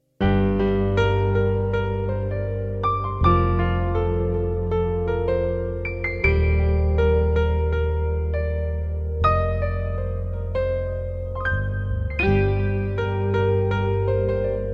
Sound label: Vocal Removed HD Karaoke Sample Track